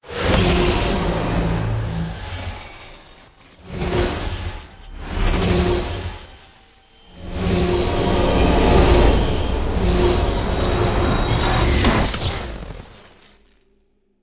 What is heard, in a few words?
victory; smite; arthur skin9